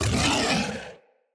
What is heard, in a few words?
Kubrow Feral Vocal Angry Attack Short